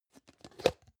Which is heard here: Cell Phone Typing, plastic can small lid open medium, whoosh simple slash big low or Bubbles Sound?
plastic can small lid open medium